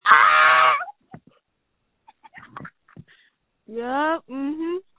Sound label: screams